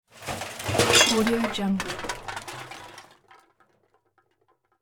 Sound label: junk debris spill; hollywoodedge